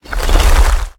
spawn dirt; Smite; Cabrakan

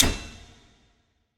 grab; smite; khepri

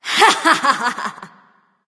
laugh; Smite; athena

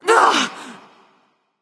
surprise; Smite; athena

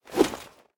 minion swing; smite; npc; roman archer

